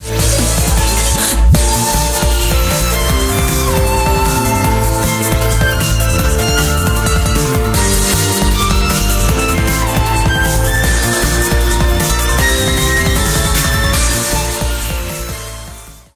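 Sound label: background music crossfade; Invaxion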